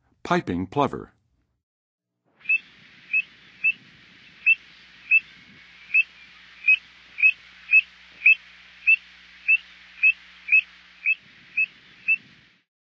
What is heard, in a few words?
Piping Plover, Birds of North America; Western USA; Western USA Songbirds